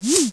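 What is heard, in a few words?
PROPELLER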